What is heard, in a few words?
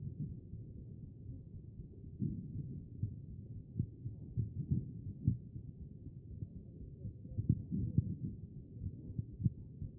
CHEST; B MODE